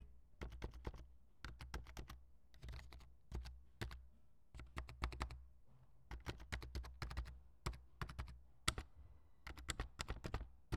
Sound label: Typing Keyboard; West Hall Tech Dump